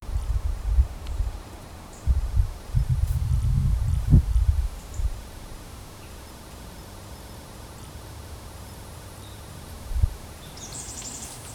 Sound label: natures space a place is, garden